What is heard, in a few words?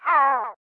cookie die; free 2 play